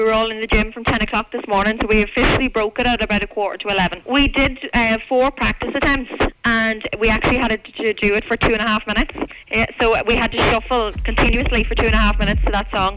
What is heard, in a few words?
shuffle